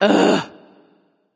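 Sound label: ugh, female; smite; voicepack; hera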